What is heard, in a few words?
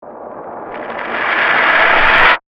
Hit Phonograph, Production, Element, Hit, Phonograph, Eerie; Hits